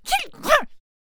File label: Death Vocals